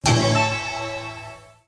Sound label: notif; gratifying appearance